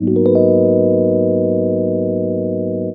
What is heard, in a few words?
rho g#, rhodes; house; PS-28 Vocal House Samples